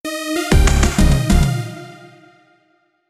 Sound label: Jingle Bath Start; Gun Gun Pixies